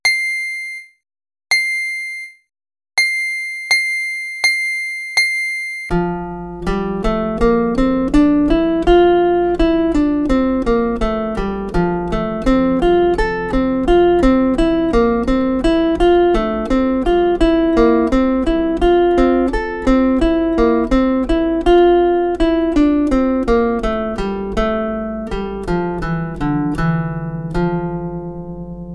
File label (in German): Tonleiter auf F, Zither; Playalong; Neue Zitherschule